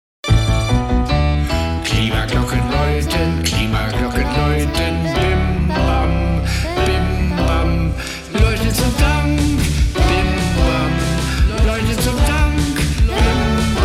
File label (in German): Finale stimmen